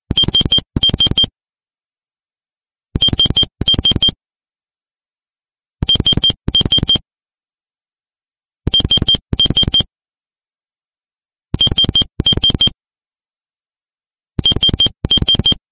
Classic Amplified

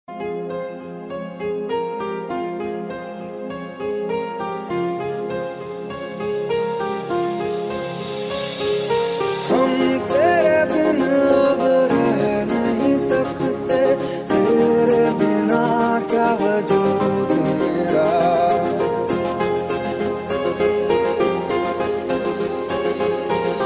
Soft Mix

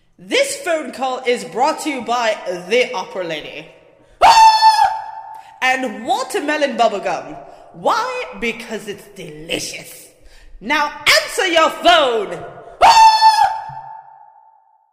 Opera Lady

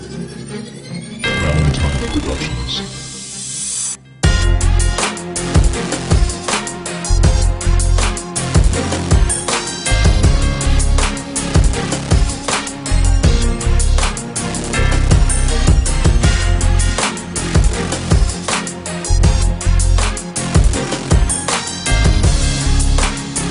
Electric Hip Hop Mix

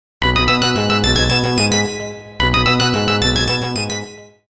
Msg Tone

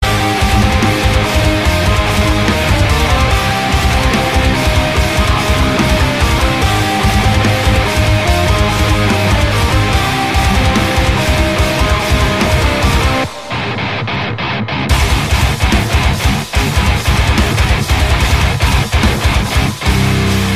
Metalcore

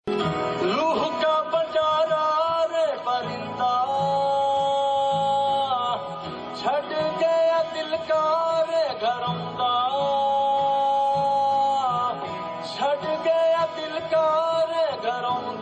Punjabi

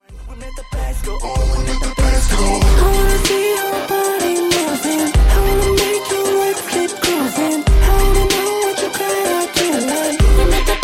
Bass go boom

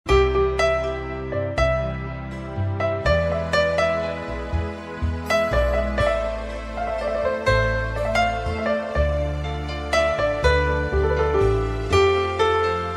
Beats

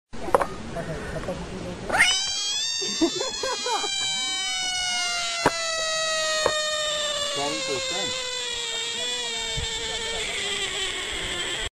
Frog Yell

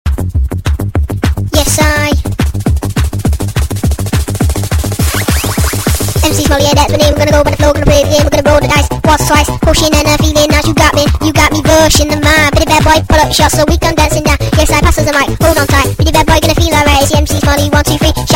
House

